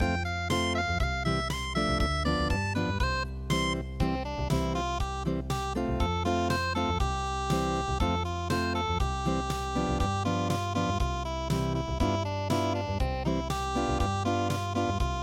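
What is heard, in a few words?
little fox unplugged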